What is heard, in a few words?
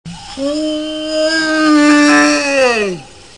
Bronc Call